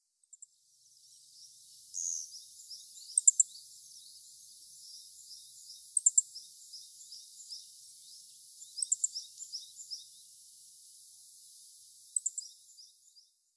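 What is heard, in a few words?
hoojiro, Meadow Bunting; fuchu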